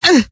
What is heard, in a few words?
pain, scientist female